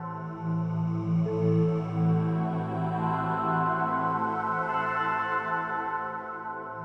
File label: SPACE PAD 140 A; Keys; SpaceSounds